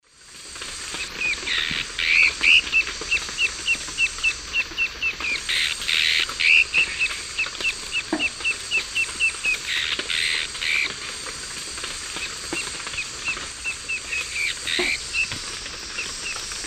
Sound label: Myrmoborus myotherinus, song; S. America; Eastern Lowlands